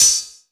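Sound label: open hi hat, Drum & Bass; Ueberschall; Drum & Bass Resonance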